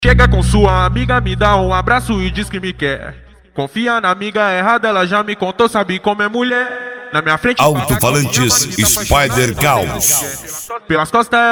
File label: funk bass